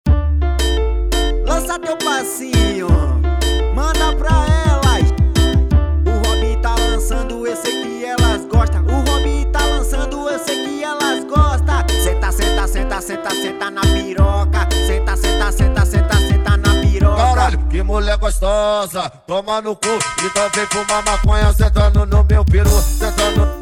brega funk

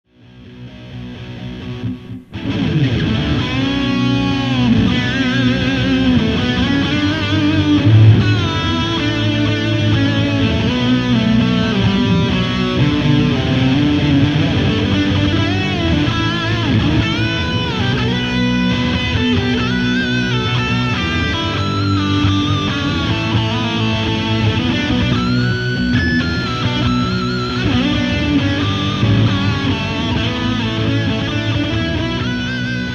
solo improviso

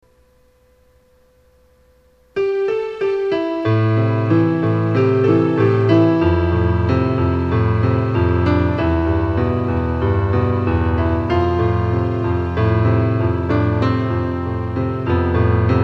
MPB instrumental

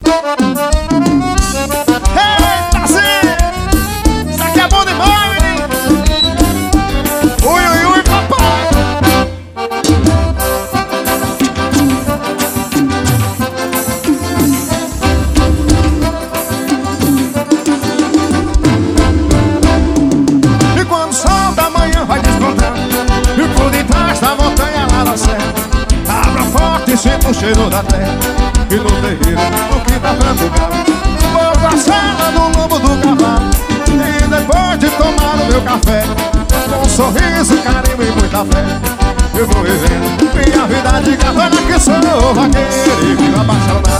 xote ao vivo

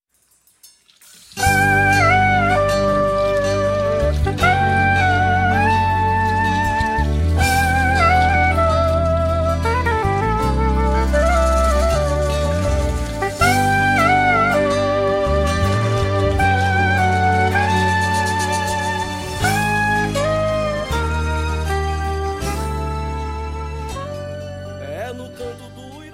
canto do uirapuru sax soprano

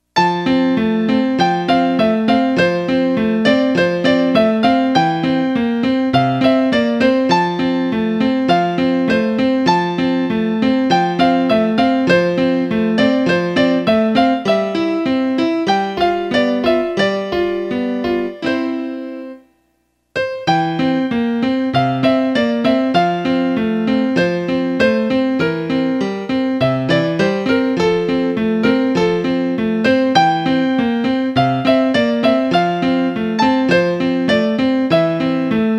piano

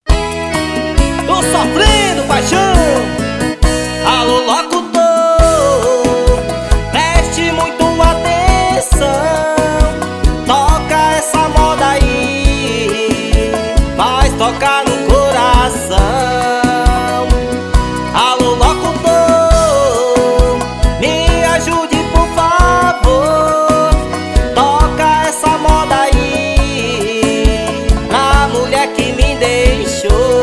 alo locutor

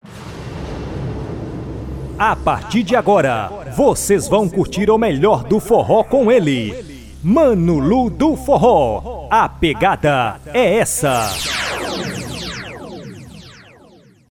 forro vinheta abertura

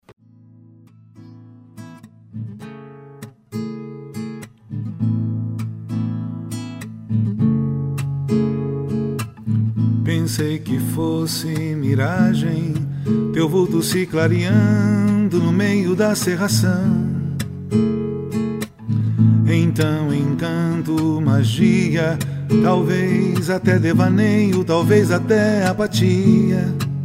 viola

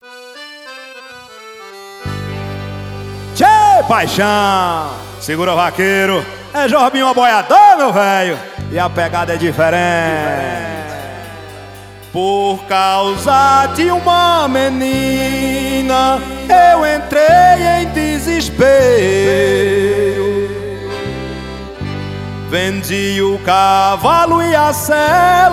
toada e banda; promocional

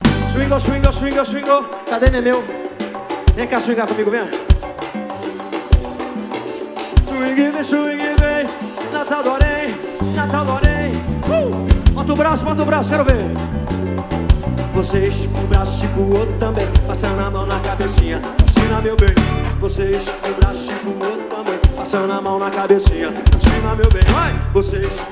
swing de rua ao vivo

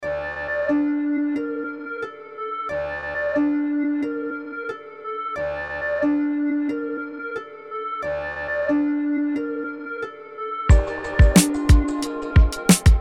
base rap pesado; panda big sirene; uso livre

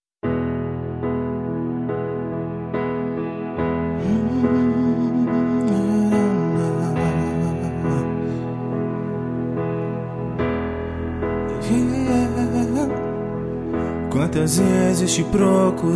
voz piano somente

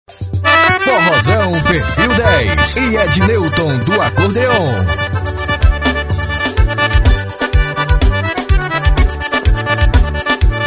forrozão solo

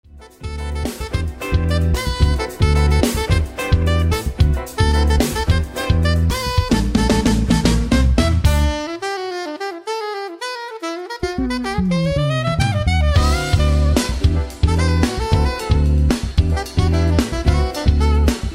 improviso sax soprano

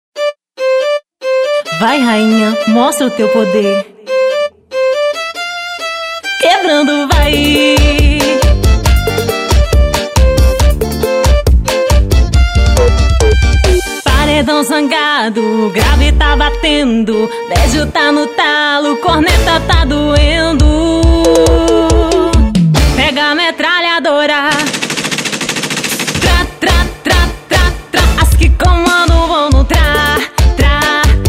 paredao

